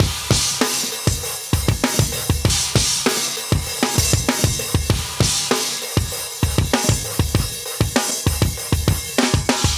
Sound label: 98 Cymbal Craze, breaks, Live Breaks